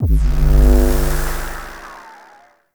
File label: Alt Pulse; Warframe; Corpus; Spear Gun; BE Ferrox